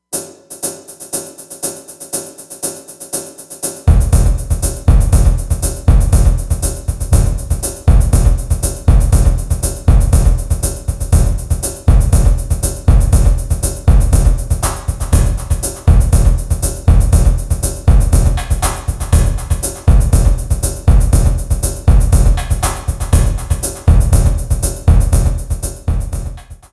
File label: drums beginning ringtone